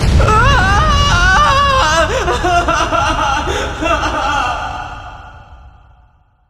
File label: screech